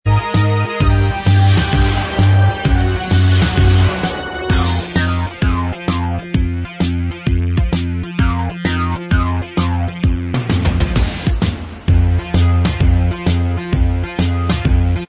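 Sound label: instrumental track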